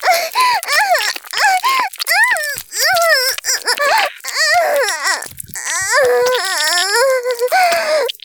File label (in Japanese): 絶頂 中出し; 絶頂+潮吹き+中出し ランダム再生